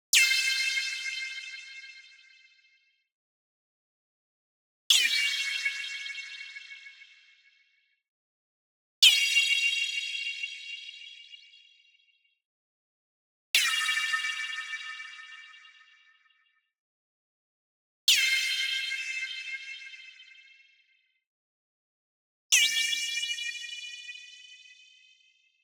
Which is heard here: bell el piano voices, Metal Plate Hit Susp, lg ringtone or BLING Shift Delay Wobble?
BLING Shift Delay Wobble